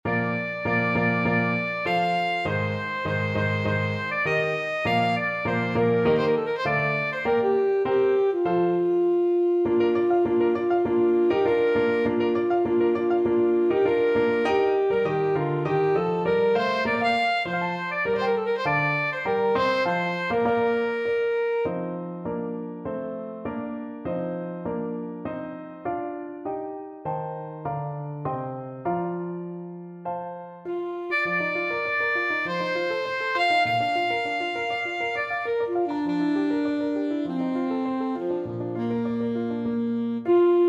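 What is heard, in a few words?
scottish fantasy, alto saxophone; bruch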